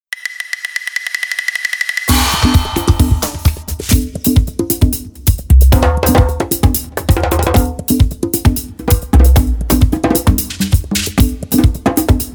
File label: ethno percussions